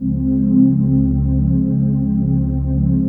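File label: trance pad; Best Service; XX Large - Pads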